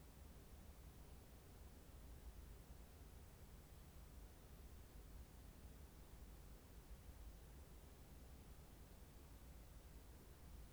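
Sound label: H145 Background Noise, helicopter; ec145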